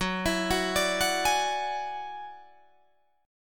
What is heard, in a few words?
F sharp 7th Suspended 2nd Sharp 5th, Guitar, Drop D